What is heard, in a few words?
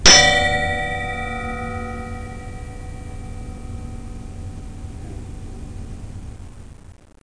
Siren